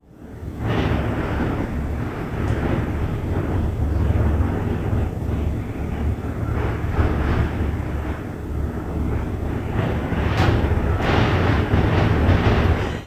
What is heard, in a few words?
Wind; Dana